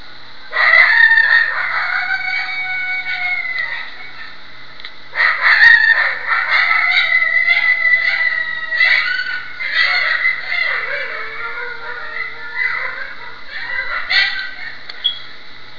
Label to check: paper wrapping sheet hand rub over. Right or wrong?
Wrong